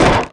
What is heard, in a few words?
impact; RallyC